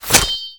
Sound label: Sword Shell, Damage